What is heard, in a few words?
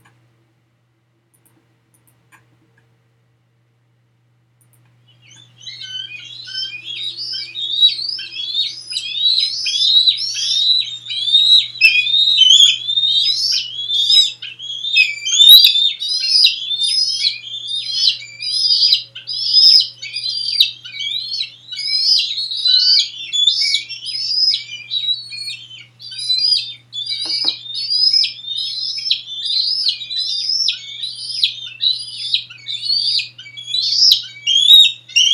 Eagle Juvenile Begging Calls